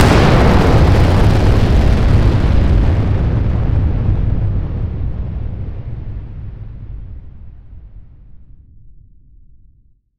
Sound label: Huge Explosion